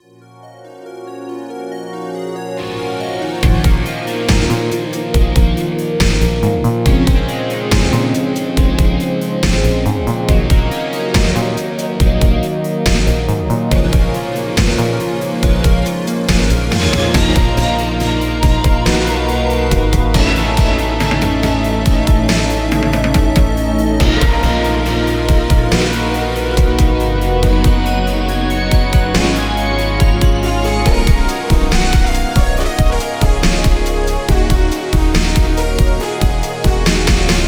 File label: Opening Cinematic; Gravity Circuit